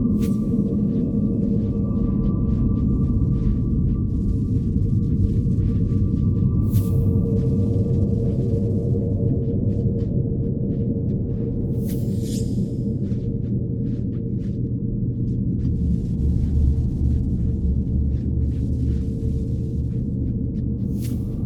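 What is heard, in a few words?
ambient particle storm; Stellaris; cosmic storms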